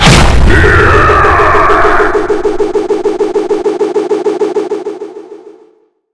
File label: axe skill exp; dgaxe; cstrike